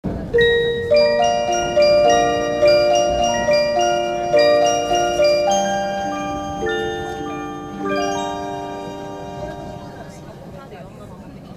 chime; odakyudentetsu

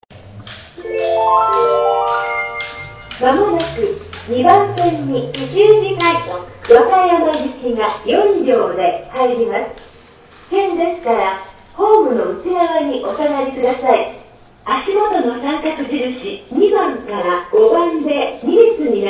musota track2 kishujirapid; nishinihon

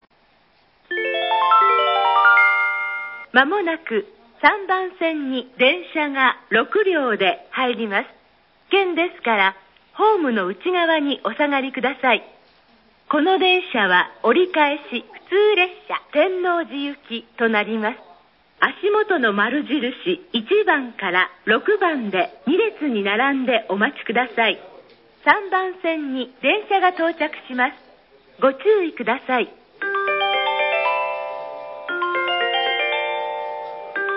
wakayama track3 local; nishinihon

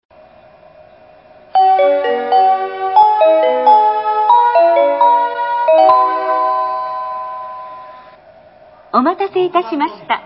kyoto track7 hida34go; nishinihon

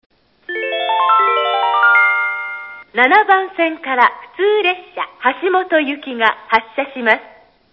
wakayama track7 local hashimoto; nishinihon

